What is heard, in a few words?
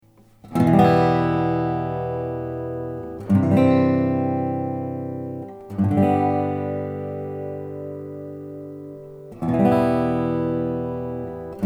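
lowden f50c mastergrade madagascar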